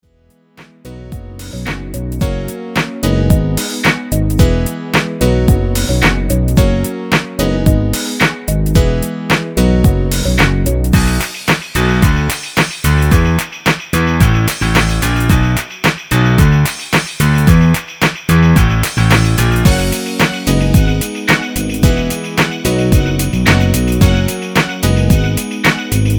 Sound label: m Piano o Backings